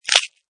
Bone Break, Human or animal bone cracks and breaks.; Injuries; Combat; Lynne Music